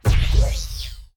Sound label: Radio Imaging Hit; Neptun